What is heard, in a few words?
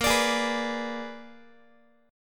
A sharp 7th Flat 9th A sharp down Guitar; A#7b9 strummed; JGuitar